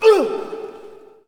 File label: Hockey Male Hurt; SFX Kit; Hockey Vocal Sounds